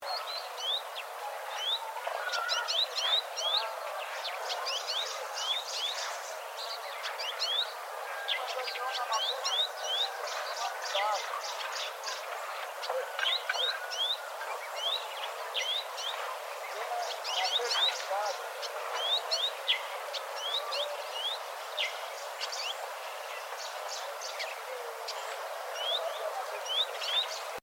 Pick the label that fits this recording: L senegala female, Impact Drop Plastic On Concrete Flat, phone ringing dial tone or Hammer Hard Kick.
L senegala female